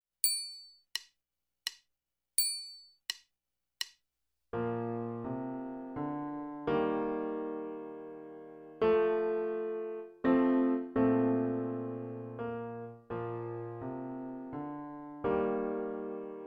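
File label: Morning Song - Trumpet, Tuba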